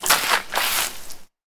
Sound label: Mopping; Prison Architect